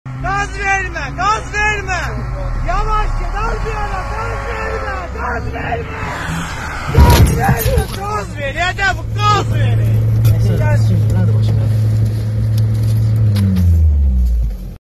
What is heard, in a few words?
new music tanze khandedar